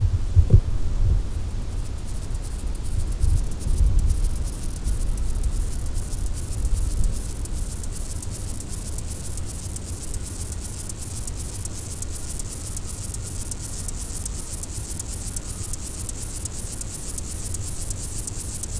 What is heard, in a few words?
C apricarius, Chorthippus apricarius